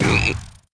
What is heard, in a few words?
wep frog explode